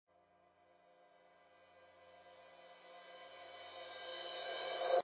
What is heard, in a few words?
Brush Crash Reverse; Lounge & Reggae; Loopology; Ska Till Ya Drah